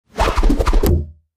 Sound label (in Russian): zvuk nozha i dereva